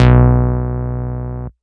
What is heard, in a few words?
Root B, Ultimate Bass; Vengeance Samples